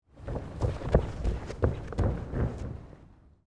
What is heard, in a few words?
Gymnastics Run, Sports, Gymnastics, Run, On, Floor, Mat; Miscellaneous Sports; BlastWave